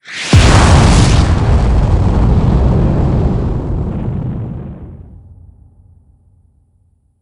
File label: blastoff